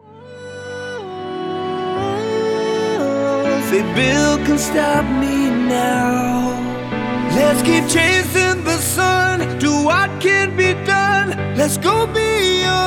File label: Male, ringtones; Honor Magic 8 Pro